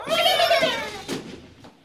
Bird Green Slingshot; fowl

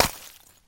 Ice Collision, Ice Blocks; fowl

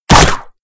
Chinese Grass Destroyed; fowl; MoonFestival Blocks

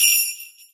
Christmas Bell Collision; fowl; Christmas Bell Blocks

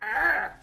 Bird Black Talk; fowl

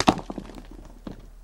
Stone Collision; fowl

